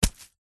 wet cloth dab squelch hard single; foley; zapsplat

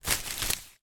hand bash ruffle plant leaves; foley

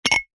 household ceramic ramekins x2 pull apart seperate

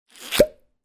cartoon squelch pop; zapsplat

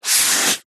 office sponge brush single stroke on paper long; zapsplat

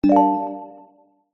multimedia notification mallet synth dreamy

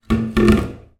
foley tub plastic drop into bathtub

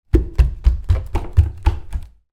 footsteps barefoot wooden floors run; zapsplat

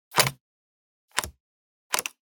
Buttons Switches; soundbits

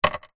ice cube drop wood; food drink

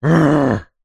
human male grumpy angry frustrated growl; zapsplat

